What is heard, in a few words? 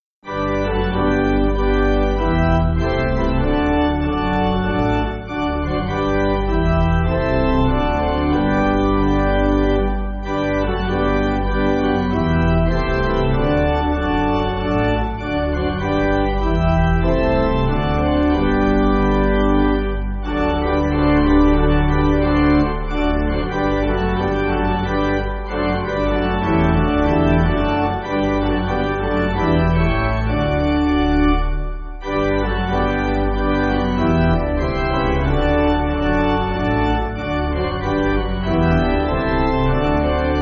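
Pipe Organ, church backing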